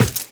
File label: Run Default, Footsteps; Warframe; Tenno